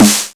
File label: Snare; VEC1; Club